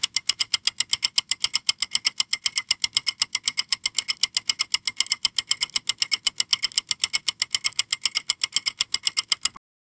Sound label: kitchen timer ticking loo